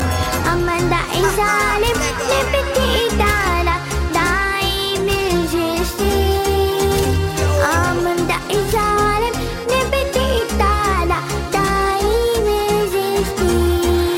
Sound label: Baby Song